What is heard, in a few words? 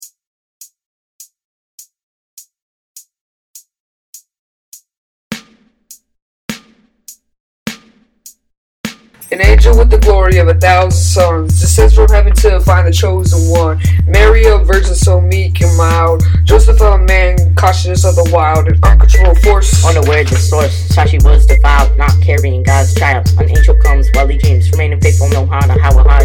Jingle Bell Rap